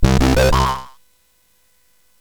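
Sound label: sfx, MOS 8580; hvsc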